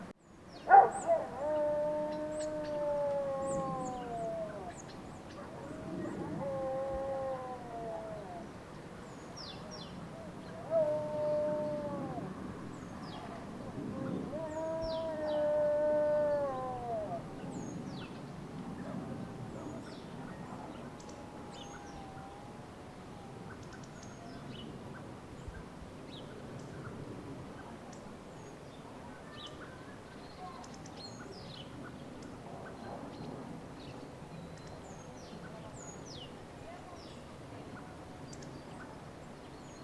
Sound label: Forrest Near Village Ambience